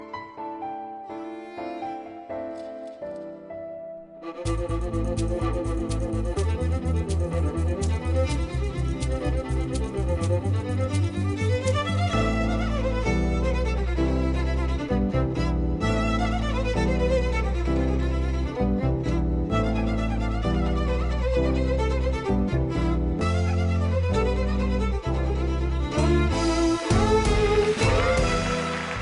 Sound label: violinist; blues